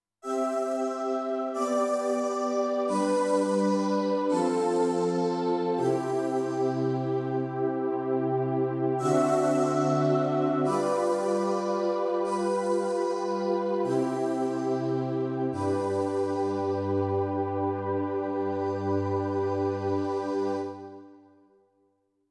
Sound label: Halo Pad